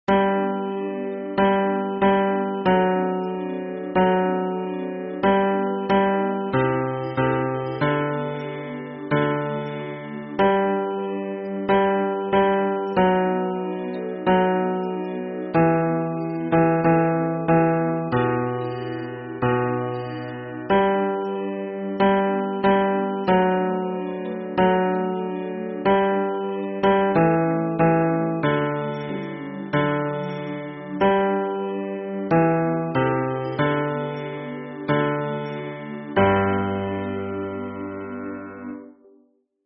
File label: hymn bass